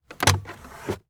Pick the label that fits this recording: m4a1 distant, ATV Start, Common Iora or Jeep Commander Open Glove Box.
Jeep Commander Open Glove Box